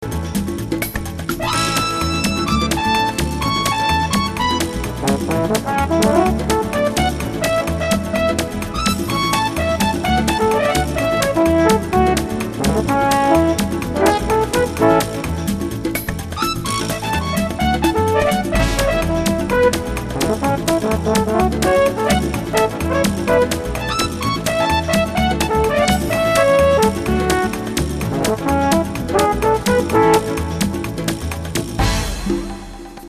Fast Smooth Jazz

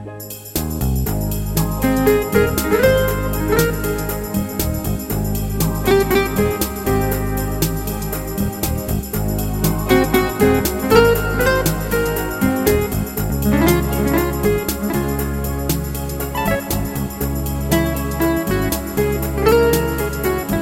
Cool Bossa Nova

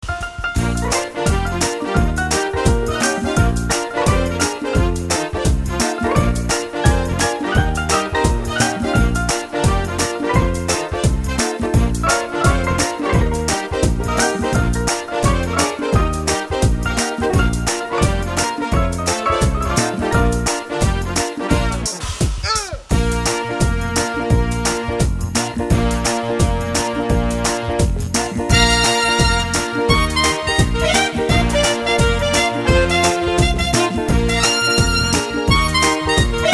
Cool Mambo

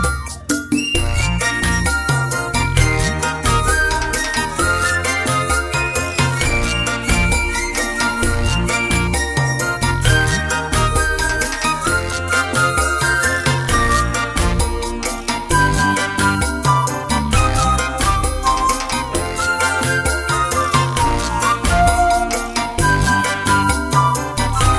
Mambo

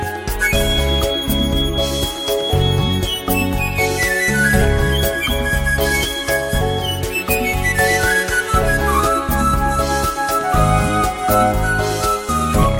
Slow Latin Pop

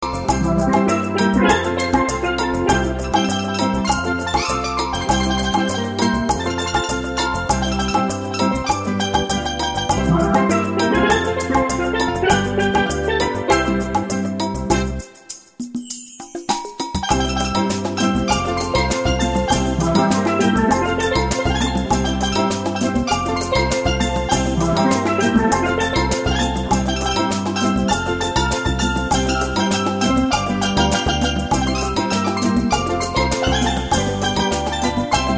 Dance the Rhumba

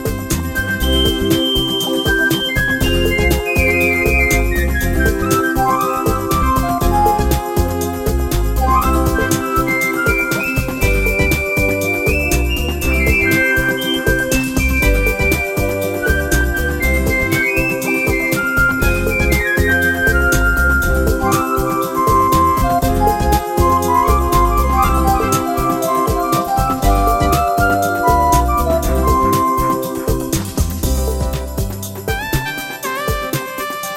Latin Smooth Jazz; escape

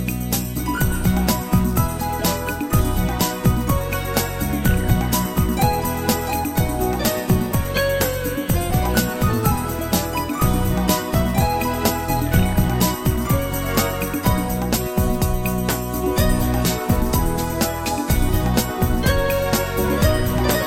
Easy Ballad

